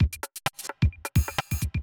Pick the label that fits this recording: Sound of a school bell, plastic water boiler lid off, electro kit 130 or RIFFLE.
electro kit 130